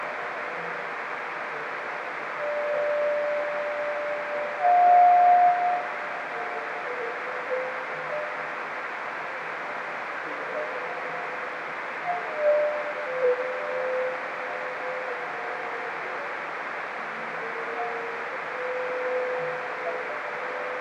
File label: camel